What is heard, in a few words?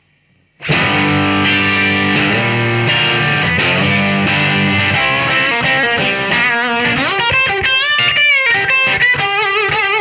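gain over high gain tele bridge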